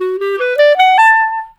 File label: Clarinet; Sonic Foundry (Sony Creative Software); Jazz Solos and Sections